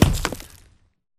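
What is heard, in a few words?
Bullet Light Ballistic Dirt impact; Titanfall 2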